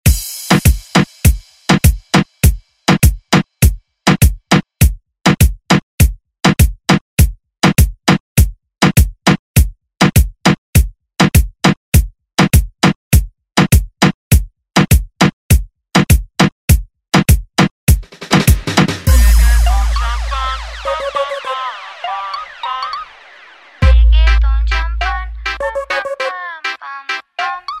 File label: Reggaeton Re Drum; DJ Mhark